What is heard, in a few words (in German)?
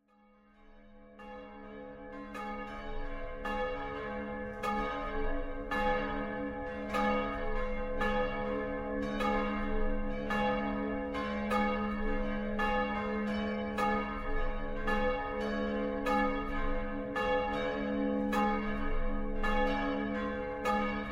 glocken; dobbertin alt